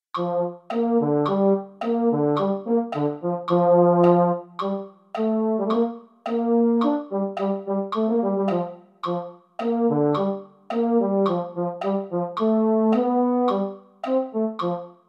jingle bells baritones, holiday brass

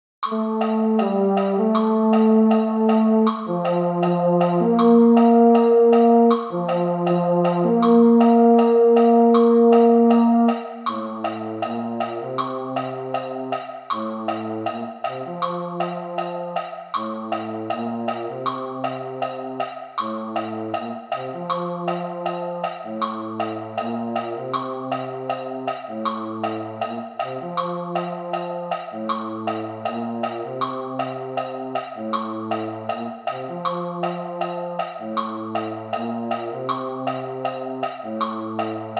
Baritone; concert corps

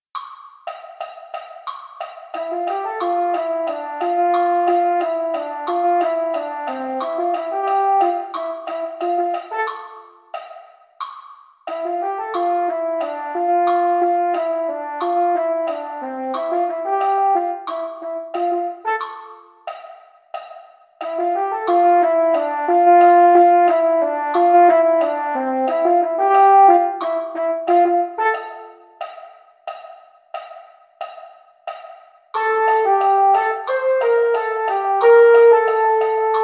mellophone slow practice exercises; mini corps

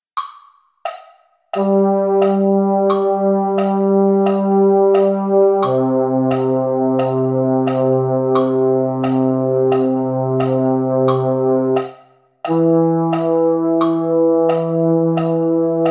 baritones; holiday brass

